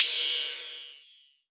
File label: Rides, Cymbals